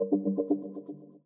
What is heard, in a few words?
ui smoother friend online; steam